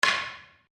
Wood On Wood; Material components for constructing more complex sounds; Materials; Foundation4

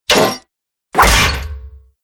Battle War 2 Heavy Blade Attacks, sword

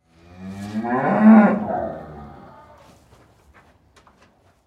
Cow Moo Walk, Cow Moo Walk Movement; Animal; Cows; Blastwave

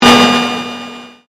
Convolution Pulse

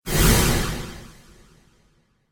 Modulation Whoosh; Whoosh Sound Effects

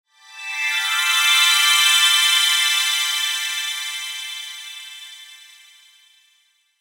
Logo Shimmer Descend; Logo Shimmer Swell Twinkle Descend Reverb; Blastwave

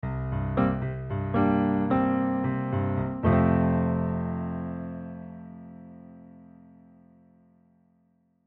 Piano Fill Warm; Pianos; Musical; Blastwave

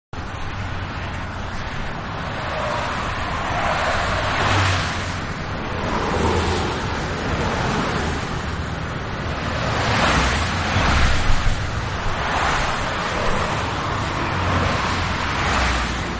Heavy, Highway-Traffic; Traffic and Cars; Manhattan Production Music

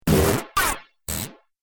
Droid Rude Noises, robot; Sci-Fi Robot Sfx

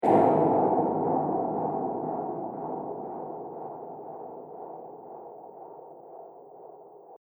Distant Impact; Distant Ko Impact; Miscellaneous Impact Sound Effects

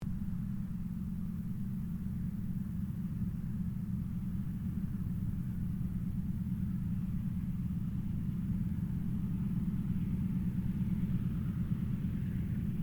AIRCRAFT DOUGLAS DC3 TAXI; Qantas 1942 DC3; Airplane; Airplanes; Vehicles

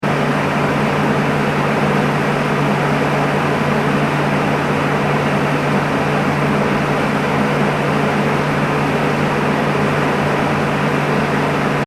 industrial machinery extractor unit; Industrial Extractor Unit Whir / Hum; Machines; McKinney Sound

